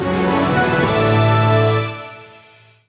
Jingle Improvement Success; mobile; Cookie Run: Kingdom

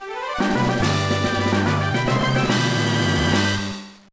Fanfare Big Success!; Pokemon LeafGreen; gba

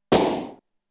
Sand Sphere; Namco Game Sound Express Vol.1; Valkyrie no Densetsu

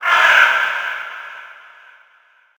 environment crow

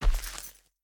Footsteps Dirt Walk; The Town

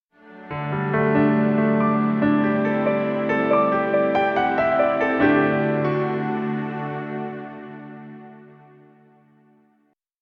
Round Over; Minna no Golf 5 Original Soundtrack

